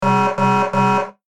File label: Time Up Game Over; disneyxd; bryx